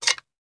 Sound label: Machine Gun Foley; Sound Ideas; Guns